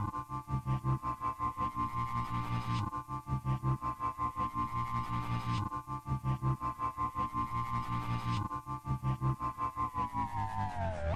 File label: Eight Vibes, Hip-Hop